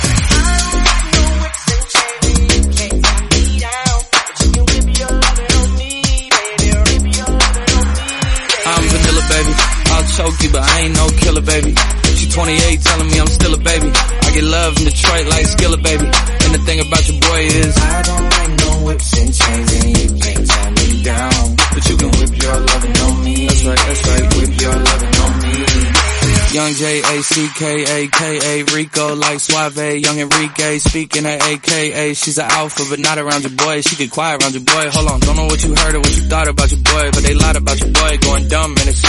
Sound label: 110bpm Db